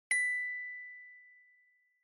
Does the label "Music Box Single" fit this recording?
Yes